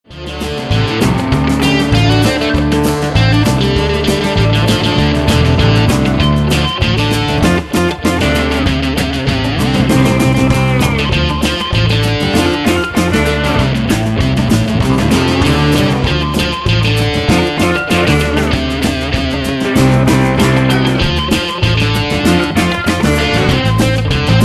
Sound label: Cluck Old Hen